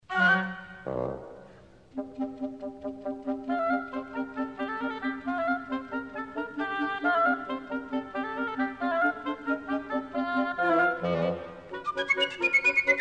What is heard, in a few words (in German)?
quartett